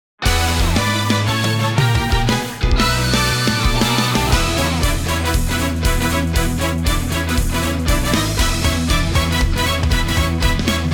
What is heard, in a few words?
Battle Subwaymaster; Pokemon Masters EX